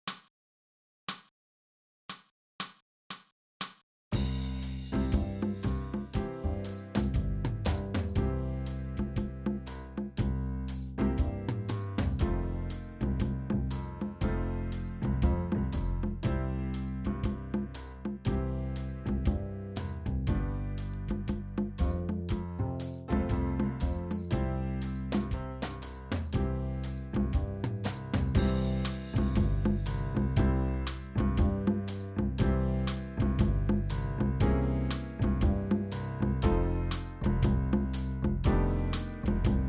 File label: 12 Bar Blues C